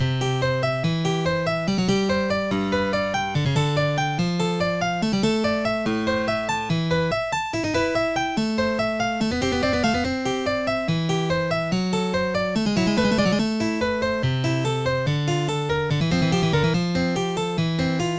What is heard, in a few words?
prelude; OctaMED MED